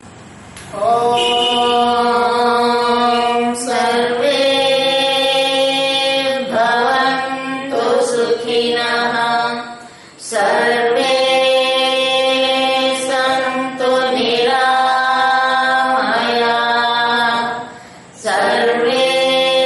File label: Shanti Mantram; Adhyatmikam Concepts